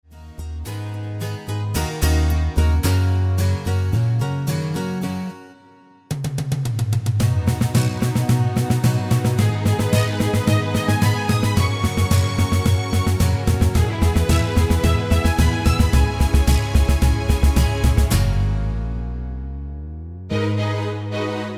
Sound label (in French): instrumental